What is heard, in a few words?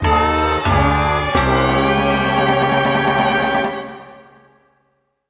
Mini-Game Lose; Frogger's Adventures The Rescue; gamecube